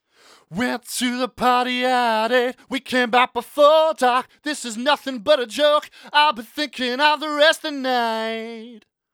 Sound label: Vox Tonal Comp Out